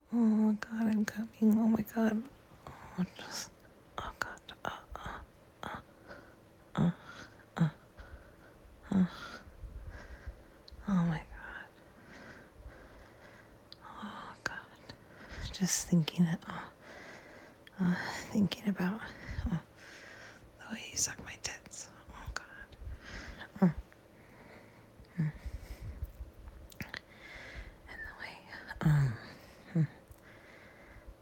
Soft moans; F4M; Domme; Praise; Moaning; Soft Domme Cums For Her Good Boy

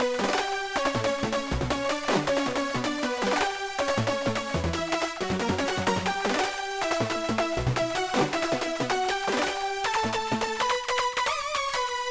Synth Example

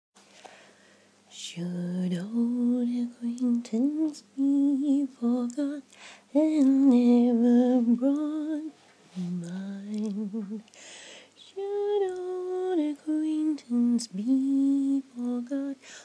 singing the new year in, auld Langs syne

